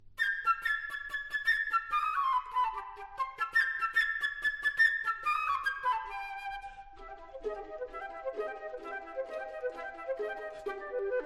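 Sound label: Tema Ptichki fleyta, Bird's theme flute; PETYa i Volk; Ptichka i Petya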